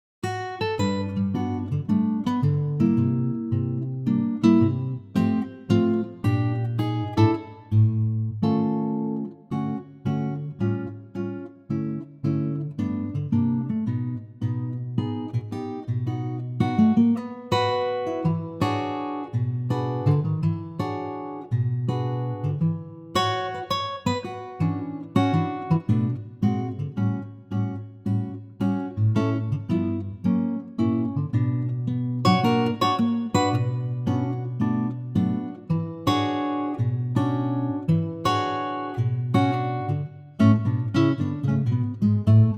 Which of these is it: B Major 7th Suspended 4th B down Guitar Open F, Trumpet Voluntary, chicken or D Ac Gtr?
D Ac Gtr